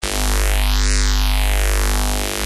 BUZZ FAZ, SYNTHS

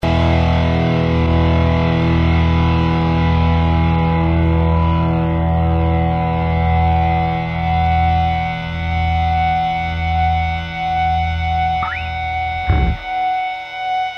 FEED HARM, GUITARS

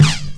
beat, drums

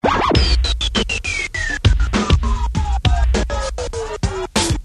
GATED BRK

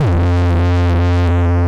sine ting

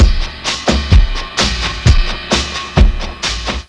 SHELL00, drums

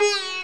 sitar

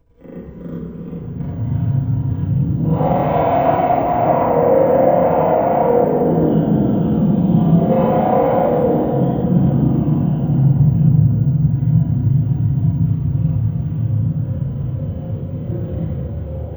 Snarl rev

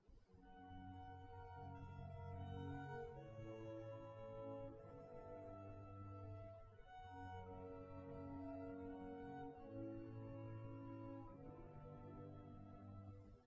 strings

